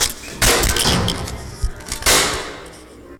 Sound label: 74bpm, Hip-Hop Street Beats; Poogie Bell